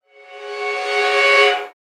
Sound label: Train horn blast clean; vehicle